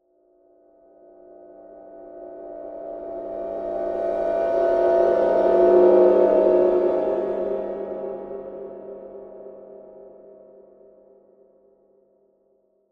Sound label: Horror Metallic, Swells, Eerie; Production, Element, Horror, Metallic, Swell, Eerie; Swell Sound Effects